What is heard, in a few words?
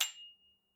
ding soft, dings